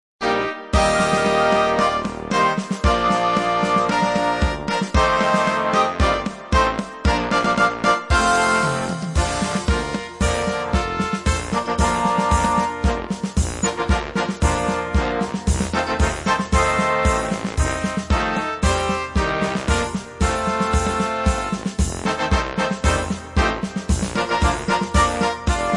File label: instrumental